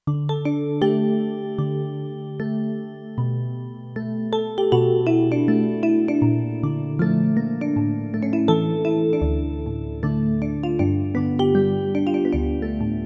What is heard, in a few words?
Malletoo; Factory Programs